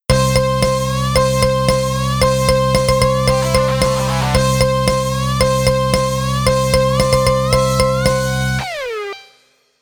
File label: jingle